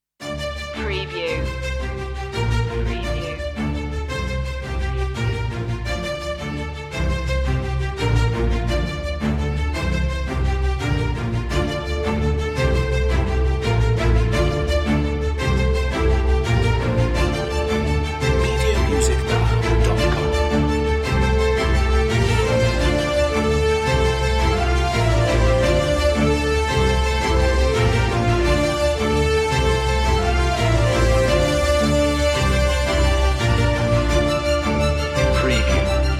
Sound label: victory